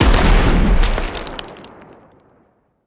Siege Engine Cannon Proj Explosion; Weapons; Enemy; Artillery; Ode Siege Engine

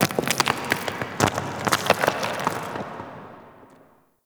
Moon Dust Fall Medium; Ambience; Warframe; Orokin Moon

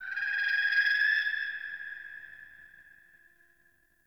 Birds High, Ambience Plains Landscape; Warframe; Eidolon Plains

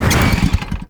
Motorcycle stop; Warframe; Vehicles; Motorcycle Ninety Nine

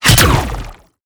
Hand Bomber Proj Fire; Warframe; ManInTheWall

